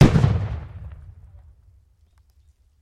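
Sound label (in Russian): негромкий звук выстрела вдалеке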